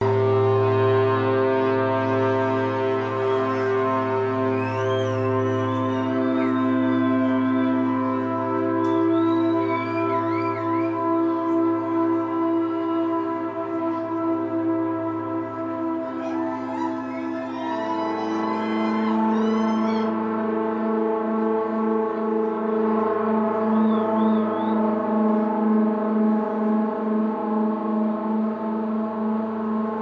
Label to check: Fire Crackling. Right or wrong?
Wrong